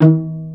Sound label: pizzi total, stringed; East West; Global Sounds